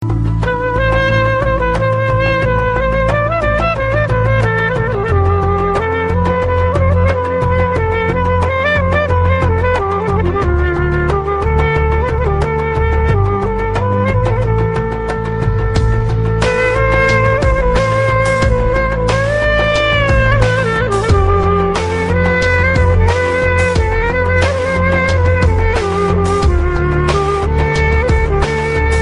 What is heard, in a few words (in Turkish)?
klarnet; memati